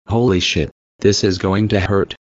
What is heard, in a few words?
Hurt